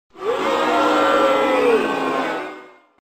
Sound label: Lose